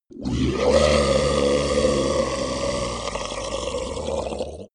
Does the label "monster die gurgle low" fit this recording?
Yes